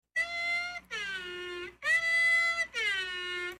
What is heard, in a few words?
alarm sound; jerma; jerma alarm meme; made with Voicemod